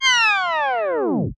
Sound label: Tape Stop